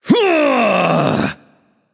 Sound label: battle cry; smite; apollo